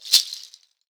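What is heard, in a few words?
shaker, Synclavier Percussion, World & Orchestral; Synclavier Percussion 2; ILIO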